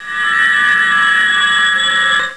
transport, electronic sounds; Sound Effects for Multimedia - Volume 1

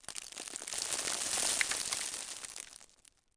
pebble; DAoC; Toa; Gamestar

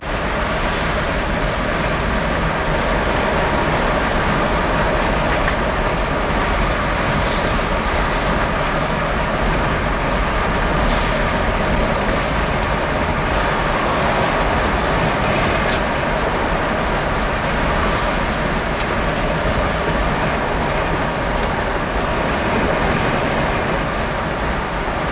CAR; WAV Sound Effects; transpo; cars

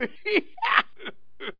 laugh

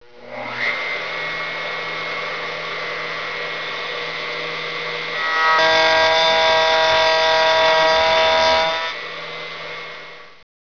tablesaw

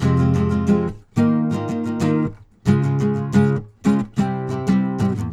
guitar; maudio; Latin Element

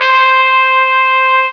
trumpet C5; Star Trek JR Audio

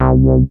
SYNBASS; Ultrasound Patch; 32,000 sample rate; 0.06 seconds

